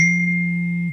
vibration ding phone

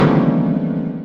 shocked sound effect; animal crossing

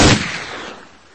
gun shoooooooot

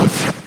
bomb explode; undertale

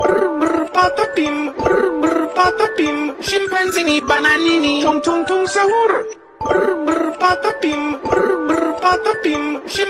brr prr patapim ringtone

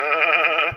laugh; magellan

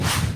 transition swoosh

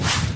transition swoosh

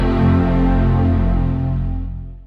mac startup chime

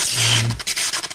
lightning

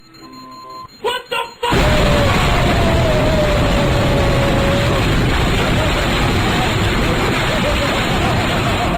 omg kaboom

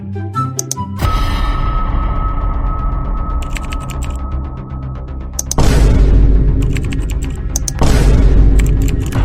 discord typing

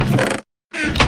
chest open and close; minecraft

